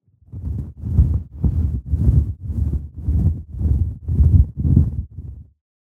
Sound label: baby dragon